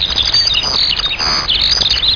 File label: skylark, animals